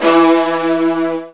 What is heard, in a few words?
E1, Virtuoso Strings; ILIO; Kirk Hunter Virtuoso Strings VOL-2